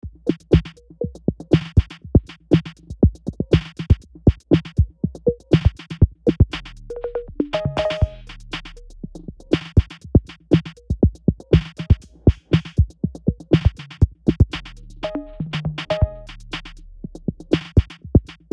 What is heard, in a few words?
808 Groove